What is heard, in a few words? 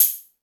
High Hat, Drum Machines E-MU Pro-Cussion